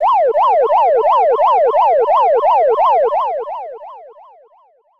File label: Siren; Super Okuman Tyoja Game SNES